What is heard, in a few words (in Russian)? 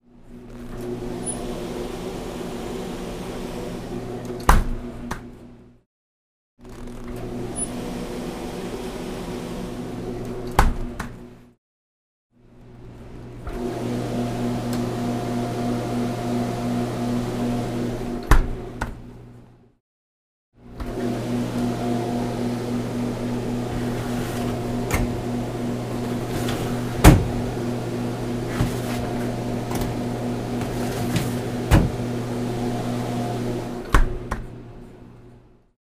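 лаборатория открывают закрывают морозильную камеру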